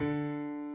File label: key